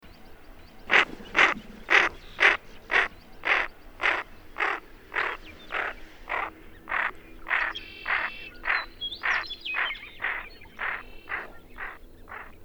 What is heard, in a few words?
American Wigeon Mareca americana; waterfowl